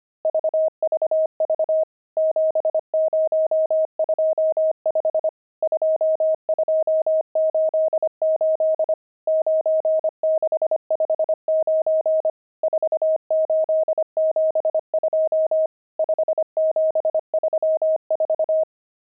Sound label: pangram, intermediate pangrams files